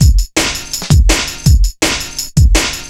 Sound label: 165, Drum Loops, Drum & Bass; Total Drum&Bass